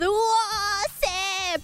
uoooh seeee

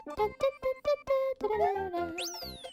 Singing; IRySinging

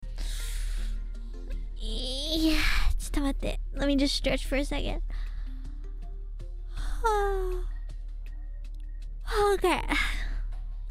stretching

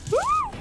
spooked; noises

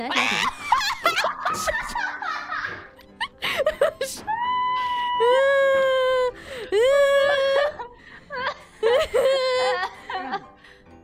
noises; BaeRyS

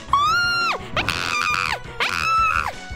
Scream; IRyScream

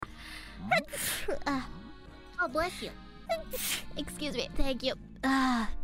Sneeze

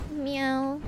meow

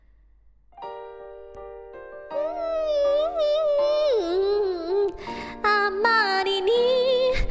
karaoke noise

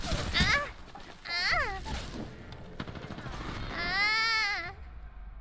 dying noises

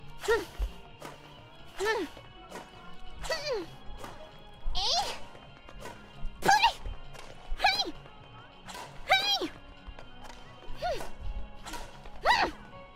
noises; BadmintonRyS; IRyS

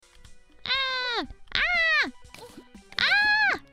screaming calmy; IRyScream

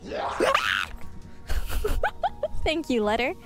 Scream thank you letter; IRyScream

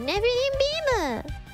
beam; IRyS; Nephilim

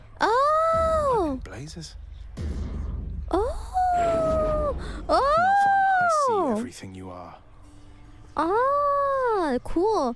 oooooooohhh

